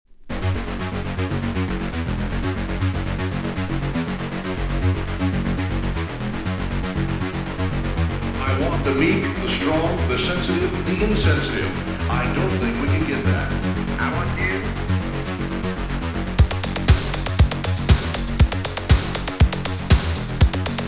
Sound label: Concrete Beat Techno Mix